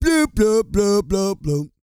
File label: REGGAE, Vocal Planet World Voices